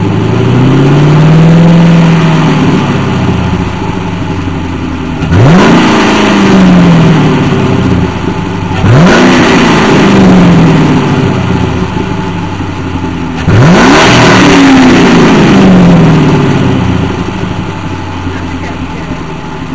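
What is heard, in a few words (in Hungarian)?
mustang gt, Kipuff hangok; Ford Mustang GT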